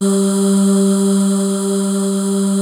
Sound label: ITOPIC G2, SYNTHVOICES; Music Store - Claudius Diemeter Schwerlos